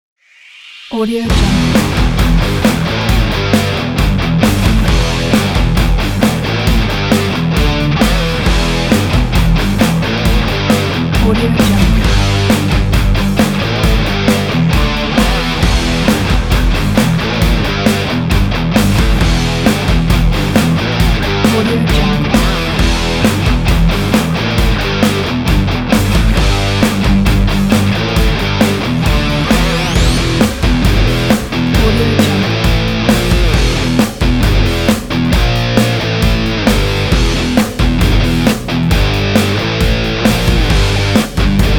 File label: power sport rock; audiocasket